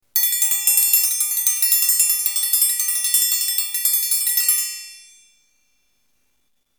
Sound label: dinner triangle